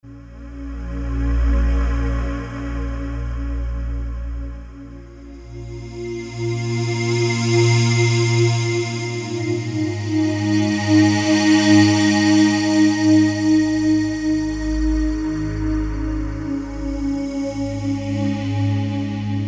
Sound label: Slow Wave